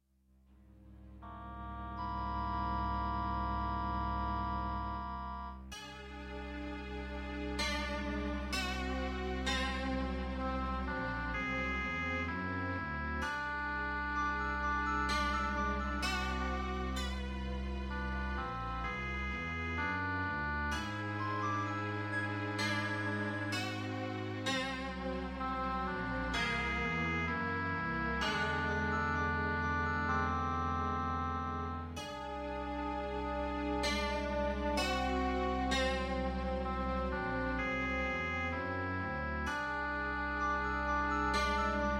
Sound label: swampland; PhoenixCraft-1.16.5; ambience music